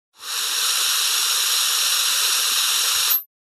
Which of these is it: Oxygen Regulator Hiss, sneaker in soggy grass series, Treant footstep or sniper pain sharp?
Oxygen Regulator Hiss